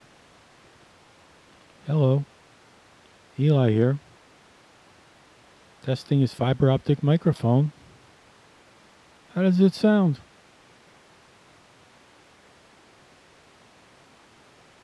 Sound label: TEST FIBER OPTIC MIC